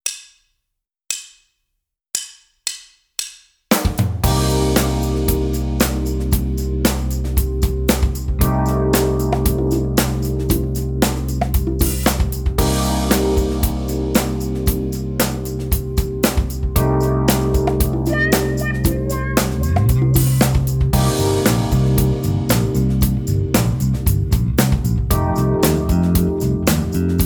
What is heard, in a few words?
Rhythm Guitar